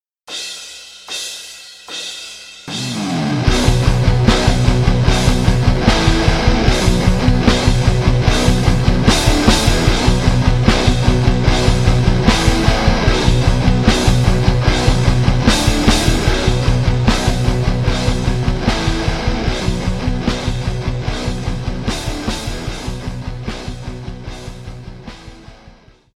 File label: Rock Tracks